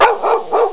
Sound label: dog bark